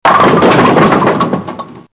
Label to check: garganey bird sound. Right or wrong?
Wrong